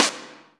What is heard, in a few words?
Percussion; Vengeance Samples